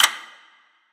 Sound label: Clock Tick